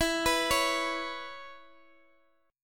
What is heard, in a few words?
E 5th 6th; E56 Chord {X X 14 16 14 X} arpeggiated